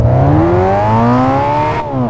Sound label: first, vehicles; countach